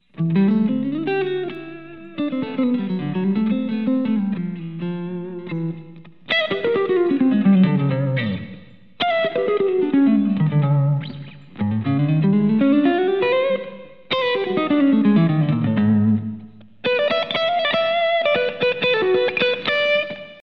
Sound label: BD2 Clean